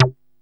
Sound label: JU 106, SEQ-FX; Masterbits; Vintage Voltage